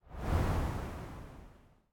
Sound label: slide; Smite; god Medusa v2